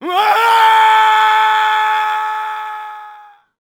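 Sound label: voice die fall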